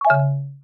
gift create; frontline

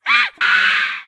monkey fall; tap tap dash

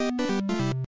die; gradient

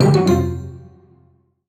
soft fail; slice fruit

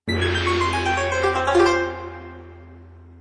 level select; ninja boy

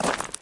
hit floor; grim fall

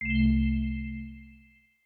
bonus get; furious road

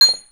hit fx; blacksmith clicker

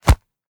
hit; zig zag line

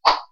slash; spring ninja

